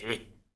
pig hi-hat; Angry Birds

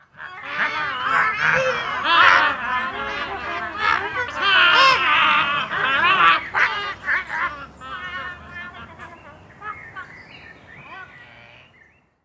birds outro, music; Angry Birds

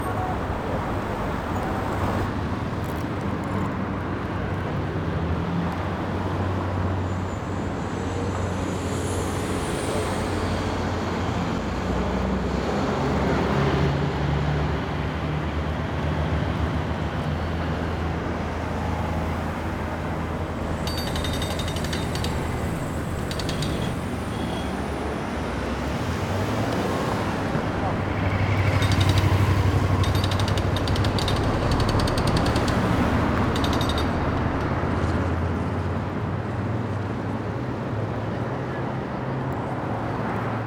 ambient city; Angry Birds

